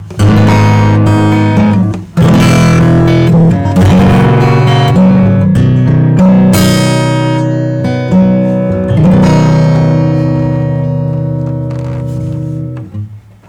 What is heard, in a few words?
acoustic Distortion Pedal